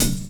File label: DR. LOOP; X-Static Goldmine 3